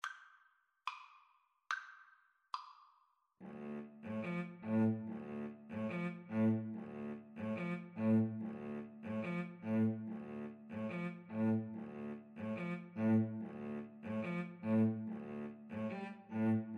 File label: Violin Cello Duet; bizet habanera